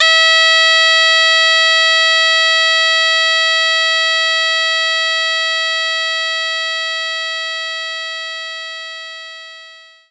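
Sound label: Shenai F sharp 3; knights try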